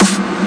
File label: melbourne bounce snare big; Hip Hop; STYLE KITS; D3ron Melbourne Bounce Kit